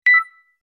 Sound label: beep beep; woocommerce point of sale